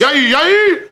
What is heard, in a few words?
yayyay